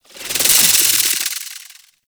Slinky Movement Rattle; Warframe; Man In The Wall

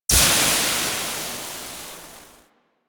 Cooking Sizzle; Ambience; Props; Warframe; The New War; Zariman; Quests

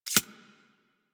Arachnoid Micro Step; Warframe; Corpus

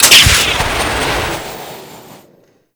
Jet Pack Dodge Burst; Warframe; Grineer Jet Pack Marine

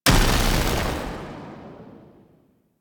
Fist Spark Explosion; Enemies; DE; Warframe; Tyl Regor

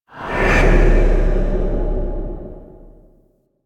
Angel Mist Despawn; Missions; Zariman; Zariman Assassinate

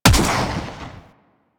Swarm Missile Shot; Enemies; Warframe; Eidolon; Jetpack Marine

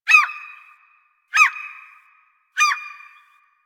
Seagull, Beach; Ambience; Warframe; Grineer Beach

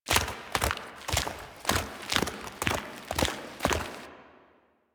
Horse Steps Gallop Loop Dirt; Vehicles; Duviri

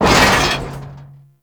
Mech Head Impact; Nechro Mech; Vehicles

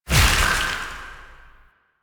Void Release Heavy; Weapons; Warframe; Duviri; Incarnon Adapters

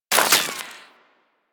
Bullet Impact Debris Rock; Warframe; Weapons

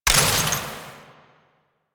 Ammo Pickup Gas; Ammo Ability; DE; Warframe; Frumentarius

